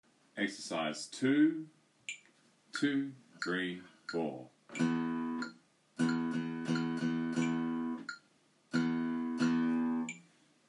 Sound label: Rhythmic; Grade 3